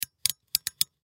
Desk Lamp Switch, Desk Lamp Switch On Click Multiple; Household; Office; Blastwave